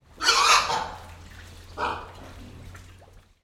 pig grunt squeal inside barn wet sloppy mud

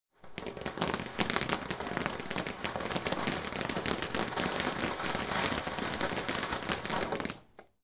firework fountain short sparks; sound effects one

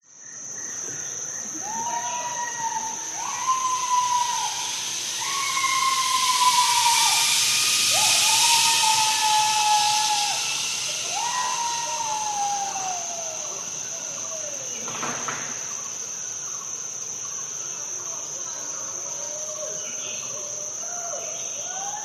zip line through jungle person scream thailand

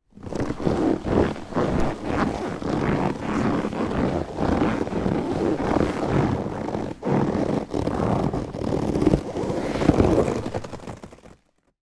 leather chair cushion movement squeak creak; household

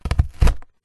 cardboard pierce pen

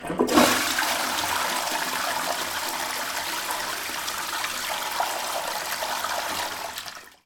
household toilet flush small room

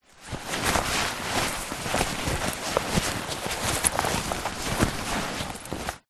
foley bag backpack small full handling; zapsplat

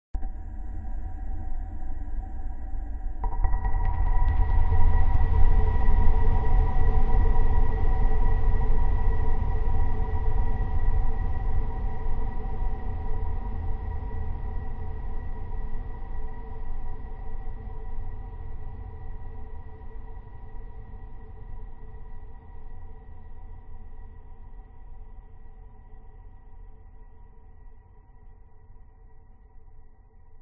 sound design cinematic hit atmos dark soft airy

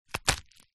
industrial duct tape ball sticky set down wood

